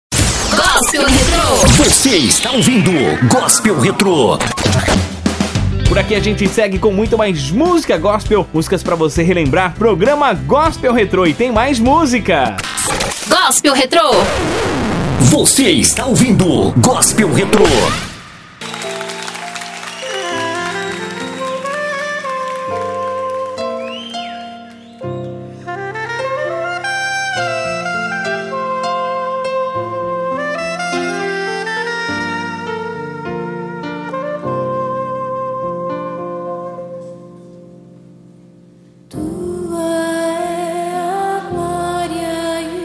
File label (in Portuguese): Gospel Retro